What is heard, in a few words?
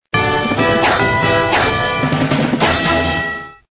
sound water